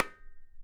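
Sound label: KHOL HEAD, DRUMS OF IND; AKAI S6000 CD-ROM; WorldSounds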